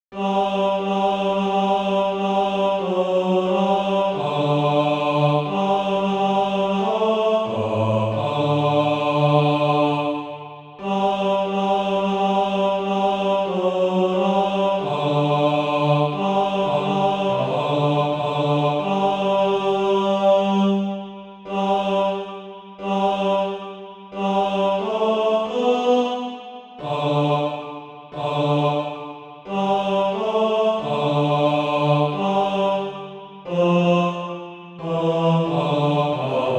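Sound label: bass, SATB Practise Music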